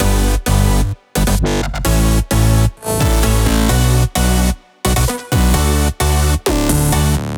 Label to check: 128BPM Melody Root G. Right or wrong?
Right